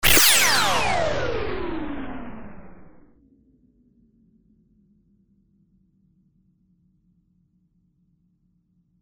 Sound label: high stab